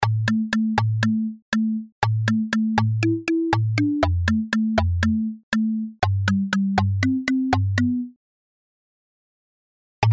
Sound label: 80s Mallet; VLX-1